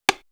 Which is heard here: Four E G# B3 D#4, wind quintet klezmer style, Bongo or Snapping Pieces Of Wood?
Bongo